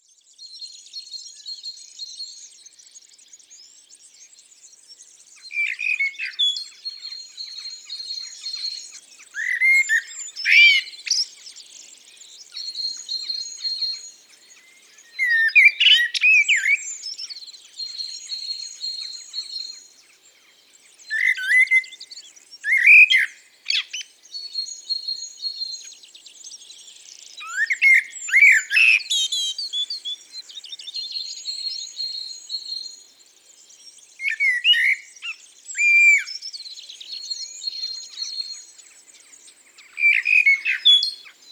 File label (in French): Merle noir; abc de la nature